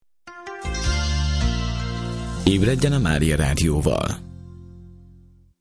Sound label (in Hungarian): Reggeli Jingle